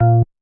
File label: bass, programmable speaker